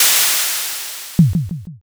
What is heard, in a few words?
airlock